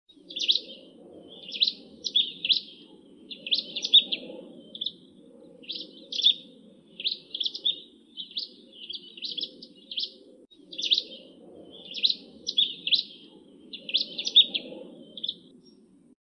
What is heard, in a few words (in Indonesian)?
suara asli perenjak jawa